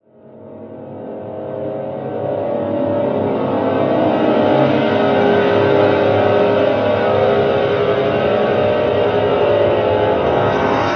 Cinematic Metal Impacts; boom library

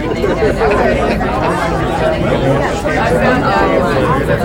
Bar Crowd; Backgrounds; 1001 Sound Effects

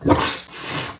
Suction Toilet Plunger; SFX Kit; Foley - Misc

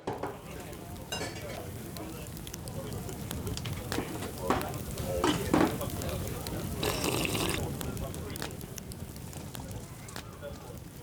Crowd Ambience; Pitch Black Audio Pong

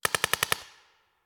Paintball Gun; Sound Ideas; Guns

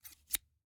Scissors, snap, snip, shear, med large, single; Eiravaein Works; Vaeyan IV

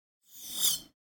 Sci-Fi Tone Small Machine Turn Off Beep; Hollywood Edge; sound designer's toolkit v2

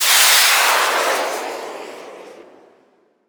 Missile; 1001 Sound Effects

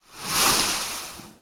rain splash; Blind Drive

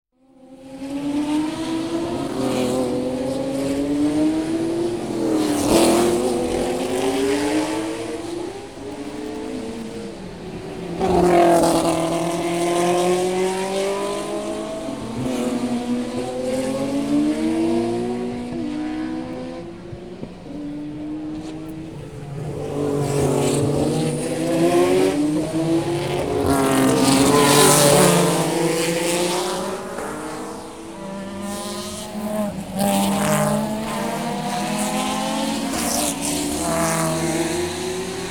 Race Cars General Atmosphere; KMP Film Video Sound Effects

